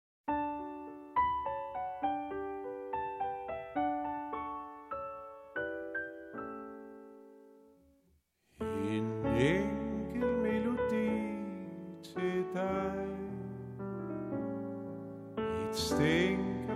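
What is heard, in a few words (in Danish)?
En enkelt melodi; historien om bent fabricius bjerre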